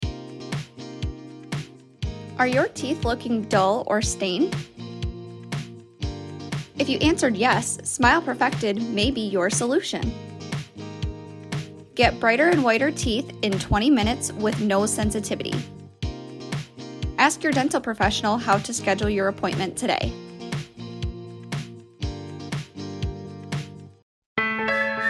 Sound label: On Hold Phone Loop